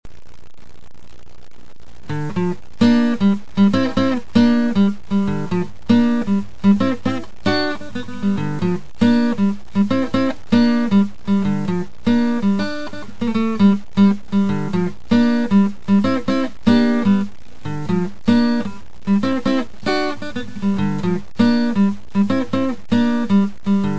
Riverboat, acoustic

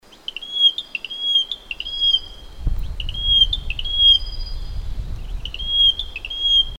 Taiwan Bush Warbler

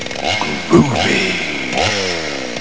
groovy

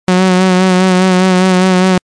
lfo

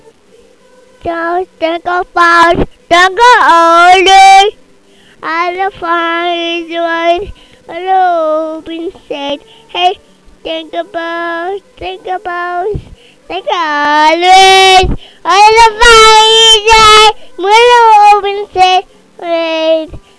jingle bells

